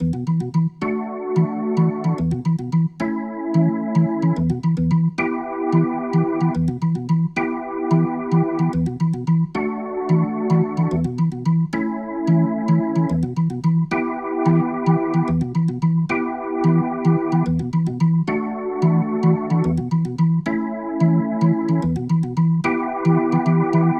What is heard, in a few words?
Rotor Riff, Organ